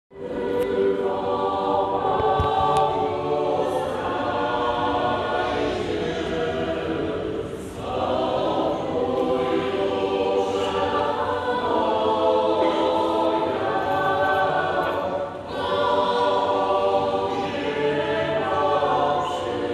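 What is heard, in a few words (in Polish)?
chór parafialny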